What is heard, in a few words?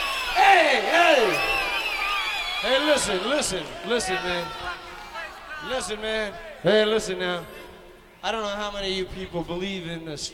rap